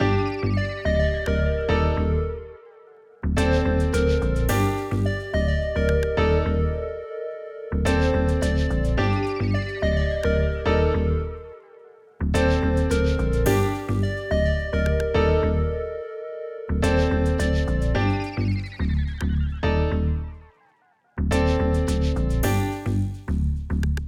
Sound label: 107 BPM G Min, Hip Hop Melodies, West Coast; Santa Monica